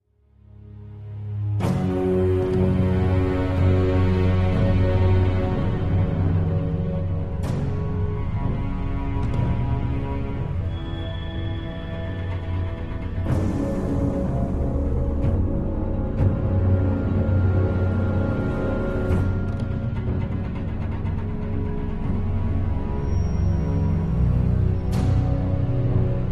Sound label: Tense full loop